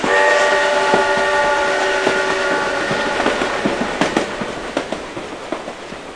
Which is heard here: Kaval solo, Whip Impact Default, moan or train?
train